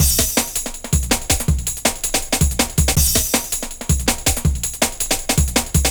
162, breaks, Loops; M8

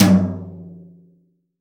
TOM, CTD TOM BRSH

